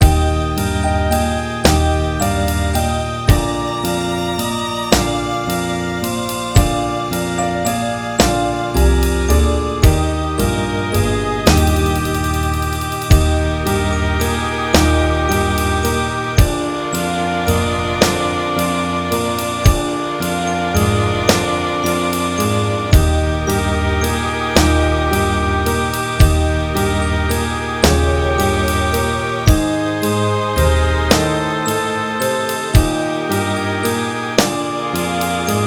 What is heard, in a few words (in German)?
Süßer die Glocken Playback